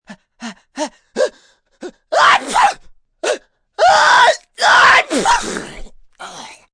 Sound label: Sneeze Long Loud, Sneeze, male, long lead up & comical, exaggerated, cold, sick, (human voice); Sneezing